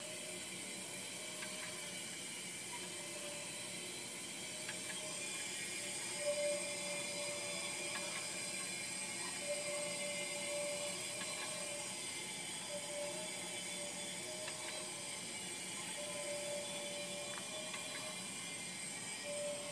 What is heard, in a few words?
spooky noises